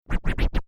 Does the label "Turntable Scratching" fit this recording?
Yes